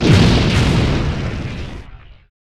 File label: explosion; prey